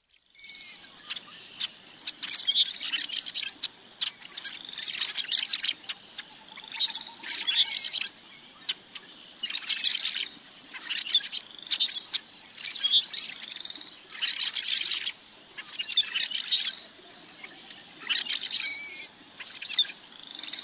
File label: Rusty Blackbird